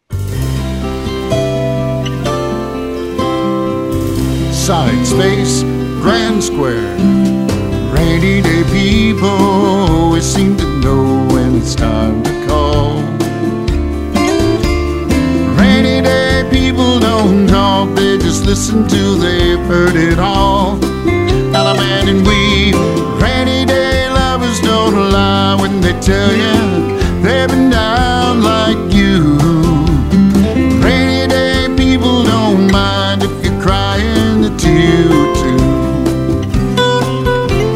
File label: Vocal